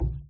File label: ball cushion, pool